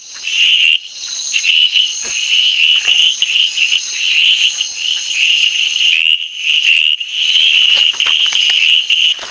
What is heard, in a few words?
Tlalocohyla picta, hylidae